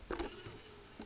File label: disc unclamp, BDR-S11J-X